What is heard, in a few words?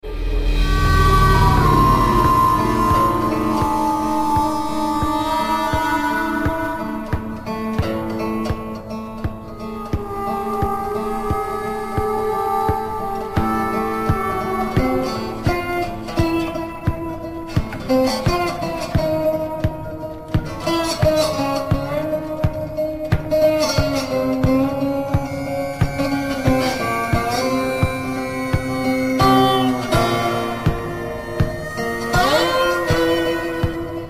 orchestra; valve